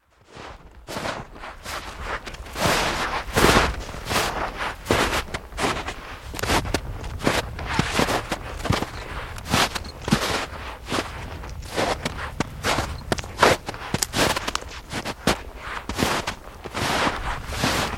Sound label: footsteps; bbc; Graham Rose